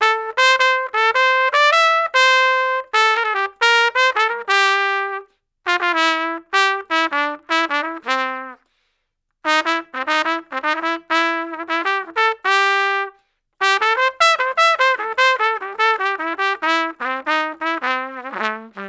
Trumpet Solo, Jazz Traditional

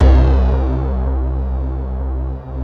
ARP, Analog Fantasies; USB Soundscan vol.49; AKAI

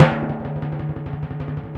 Orchestral Perc, Timp FX

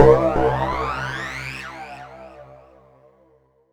C2, SynthLine; 90 s Sample CDs; NiceBeats; SynthLine VOL-1

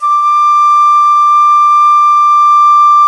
VENU D5, Winds; E-MU Producer Series Vol. 7 – Old World Instruments

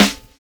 SONAR BEA; Univers Sons - Kitch 70s

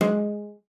CELLO FINGER G#3; Xsample VOL-5

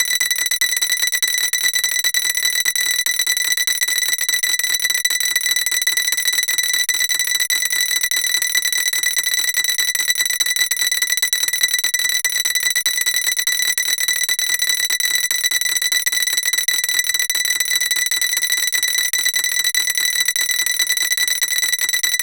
KOTO B5; CMP - Analogon; TREMOLSKI

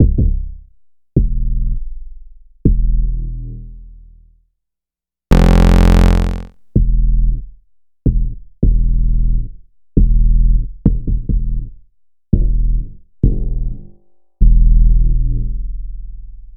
808 Experiment

